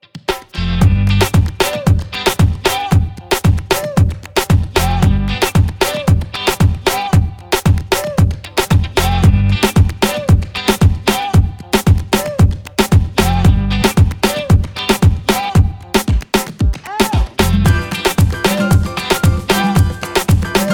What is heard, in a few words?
114 BPM Latin; Yum Yum Cookstar; Latin Alt